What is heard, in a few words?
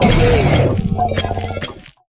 cry; ironhands